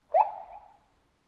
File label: Siren, South African Sirens; TheWorkRoom Audio Post